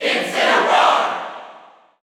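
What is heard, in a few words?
Cheer English, crowd; Incineroar; SSBU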